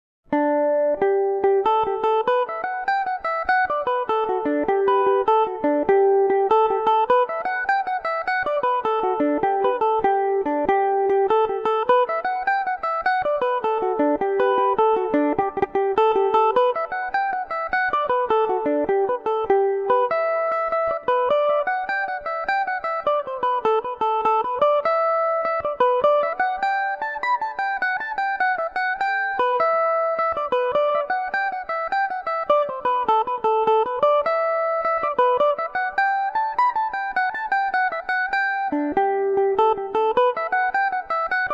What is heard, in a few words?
fat mans fancy gmaj, mandolin